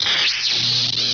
welding torch

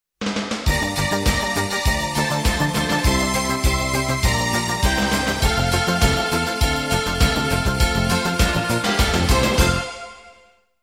Jingle Bells; Focus Music

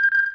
beeps misc

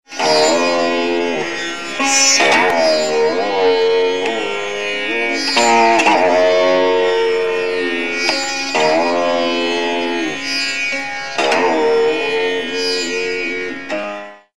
Sitar - Raga; Rosegarden

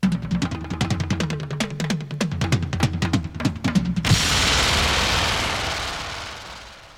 topformat maxi jingle single

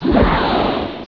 FIREBAL; Vuur

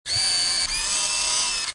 electronic arm; Zoemtonen hisses pingen woesjes tikjes

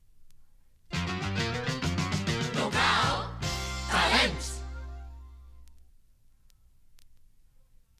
topformat maxi jingle single

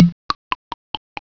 Water

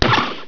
BLIP

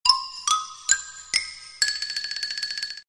funny; Rosegarden

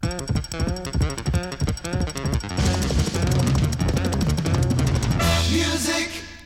topformat maxi jingle single

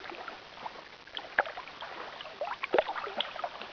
SPLASHNG, Water

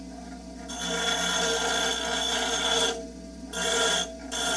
GRINDER; AKAI; 1000 Sound Effects

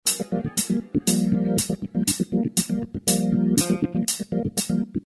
Night Tension; Rosegarden

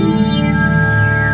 sci-fi, sound effect

